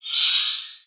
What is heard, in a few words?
Currency Cash Flyoff